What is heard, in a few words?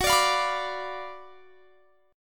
F sharp Major 7th Suspended 4th Sharp 5th, F sharp, down; F#M7sus4#5 Chord; strummed